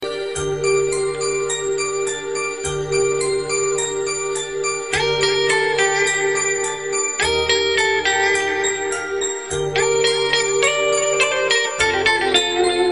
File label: Romantic Guitar Tune